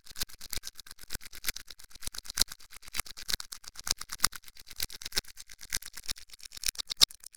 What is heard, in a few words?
Plastic Dice Toy Rubbing Sci Fi Reverse Loop